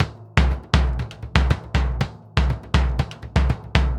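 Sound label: BOMBO SALSA, salsa drums and percussion; Best Service ProSamples vol.14; AKAI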